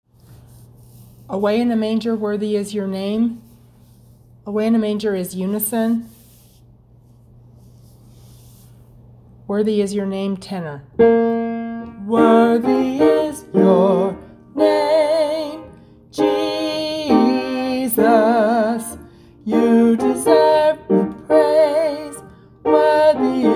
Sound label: Away in A Manger tenor